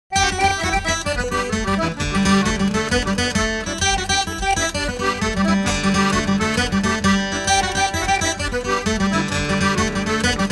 纪录片背景音乐

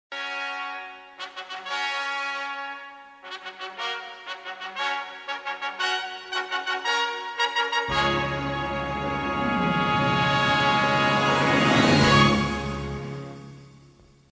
片头音乐

